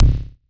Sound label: Synth Kick